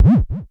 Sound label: Klone Dual Percussion Synthesiser tom